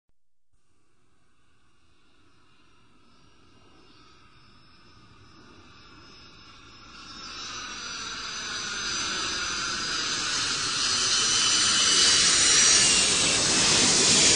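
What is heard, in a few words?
jet aircraft flyby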